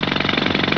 MACHIN, Power tools

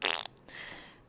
pprrfttt; Rosegarden

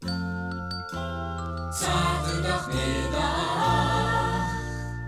jinglepackage; topformat LP jinglepackage 1

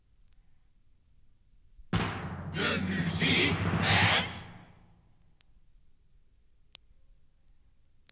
topformat maxi jingle single

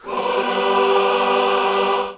chorus

